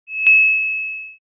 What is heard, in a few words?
shy, ringtones; standard ringtones